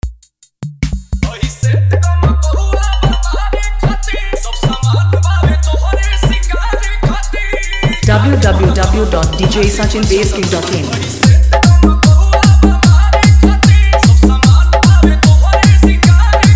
New Trending Viral Bhojpuri Dj Remix Song Hard Dholki Vibration